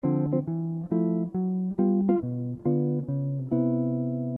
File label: bass